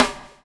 BUBBLE; Spectrasonics - Backbeat

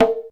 XE8 PERC, drum; Crypton - DrumBase5000

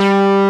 G-2, GUITAR; AKAI S-Series CD-ROM Sound Library VOL-8

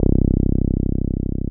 TB 303

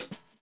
groove; AMG Gota Yashiki Groove Activator

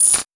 Criket Fx, Cricket

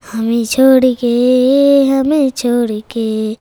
CHILD, Indian Traditions

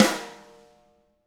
tama rim; ILIO; Double Platinum Drums 1

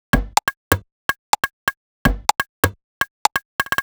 Top 125bpm, House Drumloops

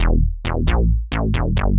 bass, Emin, 134; Big Fish Audio; Hardcore Breakbeat Action; Mellow Jack

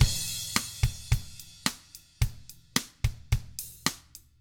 POP, Drums

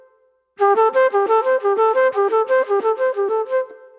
Soul Plate Flute

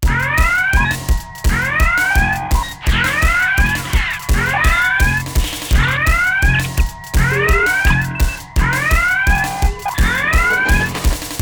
loops 175 bpm; Masterbits - Tekkno Trax

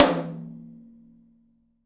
open tom; 3D Sound; 3D Drums; 10 TOM

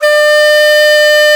DIZI, Old World Instruments, Winds

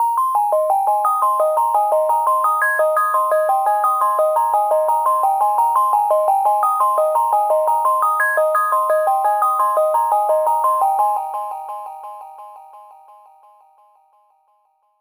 sine delay arp

